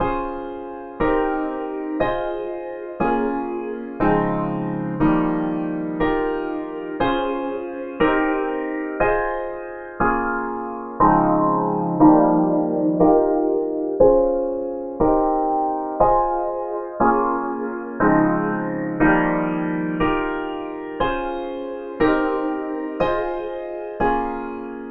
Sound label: music cloudy